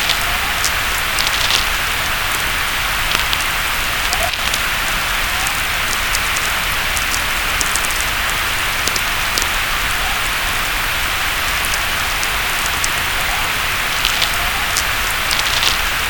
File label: chorus, atmospherics Vlf; ionogram; Norrskenslandet